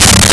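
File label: Synth Bass G3; Nintendo; July 2020 Gigaleak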